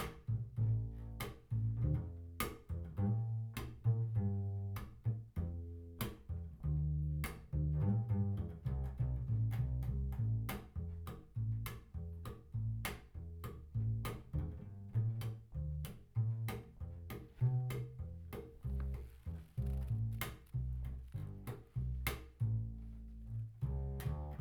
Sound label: Banjo